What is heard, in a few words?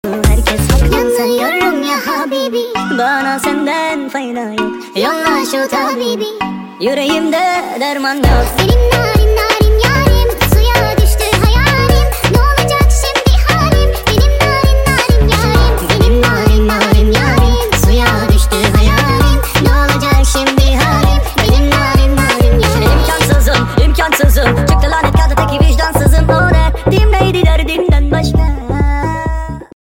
turkish speed song; turkish mash up